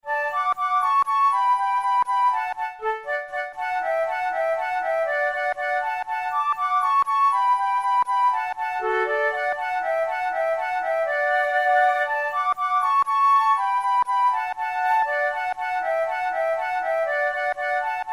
waltz duet

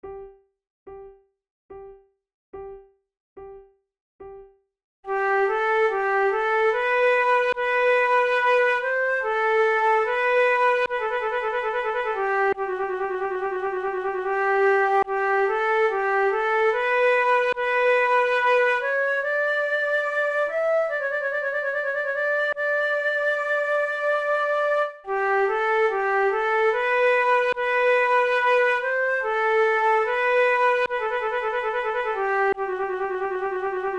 sarabande, flute

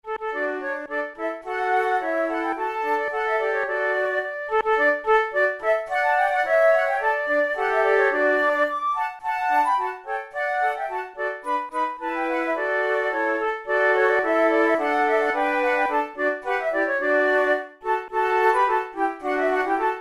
march trio, flute

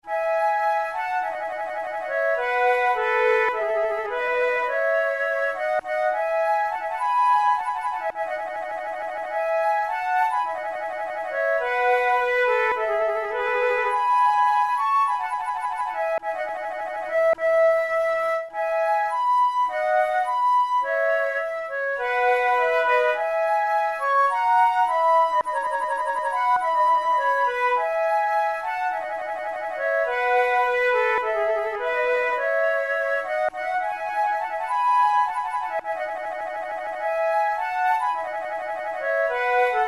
two flutes air; Monteclair; 6 concerts; flute tunes

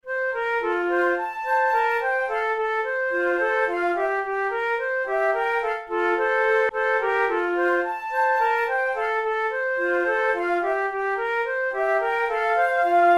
methode de flute; tulou

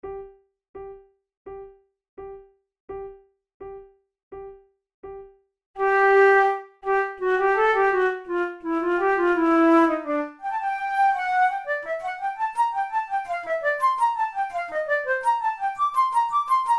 in g minor, flute

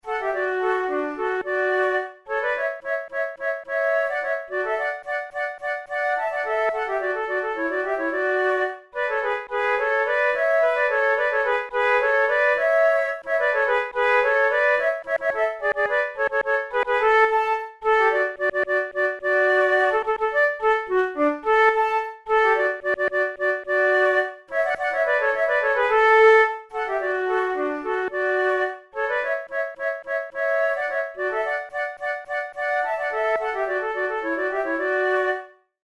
duet, flute

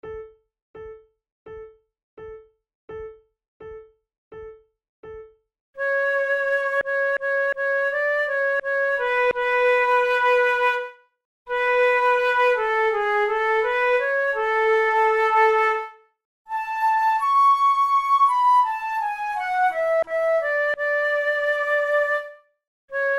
duet, flute; Moscheles; the Switzer's song of home

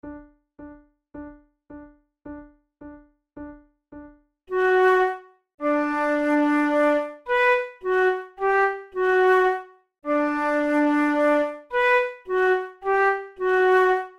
methode de flute; tulou

